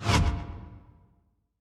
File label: erudite shot